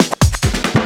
Loops Fills; Vengeance - Essential Clubsounds Vol.2

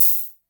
HH Open, Vermona DRM1-MK2 Hi Hat

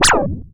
MEMORYMOOG

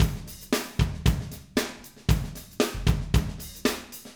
Acoustic Live Drum

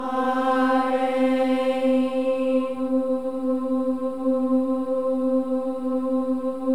AH EH; Spectrasonics; Symphony of Voices; AH-EH-EE

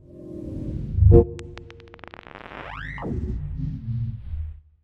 console start up

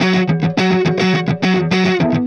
Clinical Distorted Funk Guitar

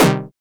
SYN SYNHIT, dance synth; Sound & Vision - Gigapack I CD 2 (Roland)

